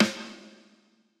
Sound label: hall resonance snare